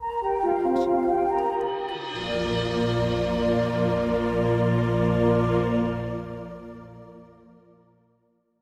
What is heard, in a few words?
Friendly Ident; SoundsCrate